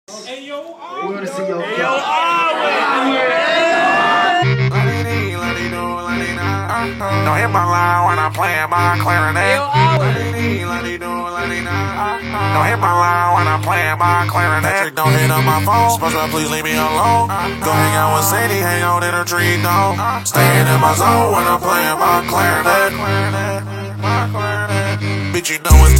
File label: CLARINET